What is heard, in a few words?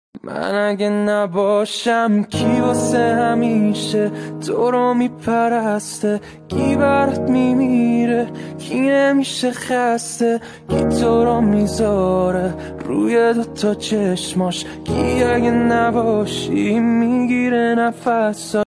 Guitar